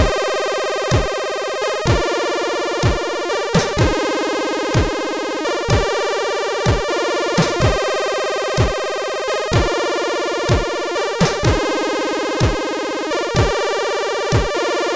Intro, sid CSG8580R5

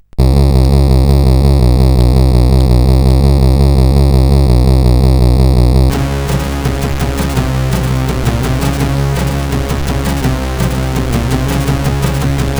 Slow, sid CSG8580R5

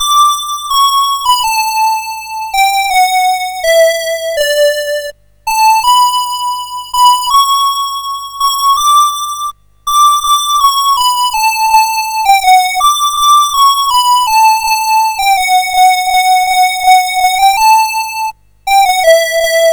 Christmas Bells, CSG8580R5

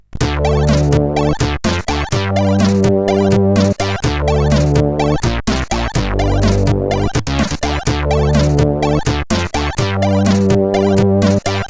sid, CSG8580R5; Vanila

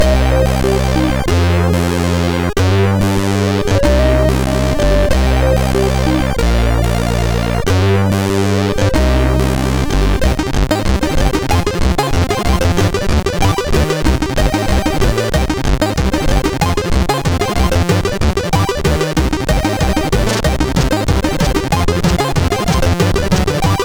Bass Themes, sid CSG8580R5

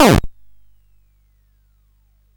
Frantic, CSG8580R5

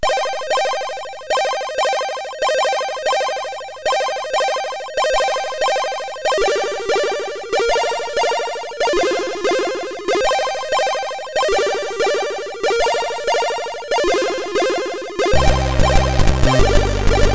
Arpeggio sid MOS6581R3; hvsc

